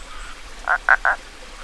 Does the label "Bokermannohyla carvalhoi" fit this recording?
Yes